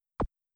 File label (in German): spieler schiesst; jazz jackrabbit 2